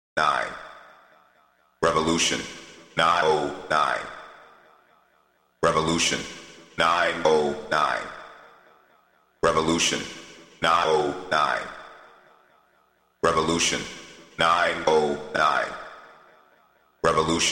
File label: Accapella